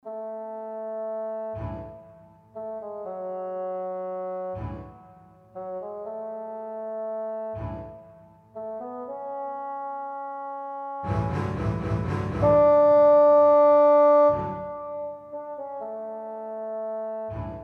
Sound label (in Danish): FAGOT VARIATION SKITSE